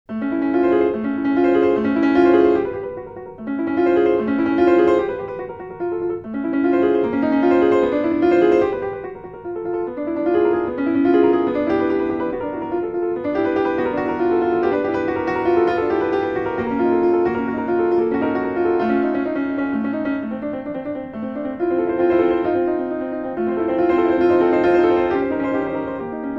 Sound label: 5 Finger Piece For Piano